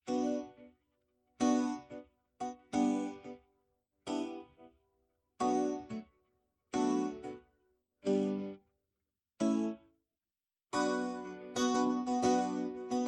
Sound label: Versão Piseiro Keyboard